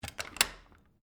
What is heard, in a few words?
Door Lock Hard Wood, Foley, Door, Lock, Hard, Wood, Front, Door; BlastWave